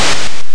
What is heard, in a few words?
door open; gnome stones